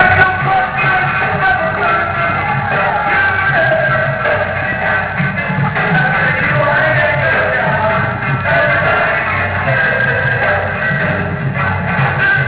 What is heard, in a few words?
dub